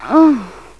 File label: orgasm